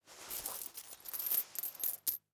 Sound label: Coins In Pants Pocket Moving; Foley